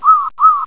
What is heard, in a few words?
GULL; Windows